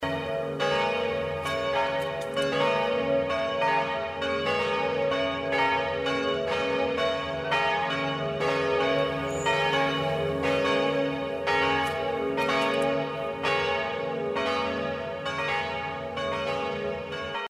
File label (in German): Die Glocken von Aumund; reformiert